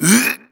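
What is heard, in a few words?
effort light, voice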